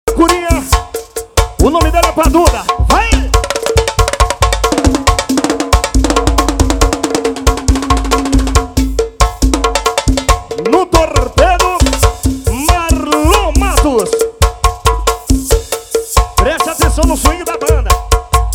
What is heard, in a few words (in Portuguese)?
percussao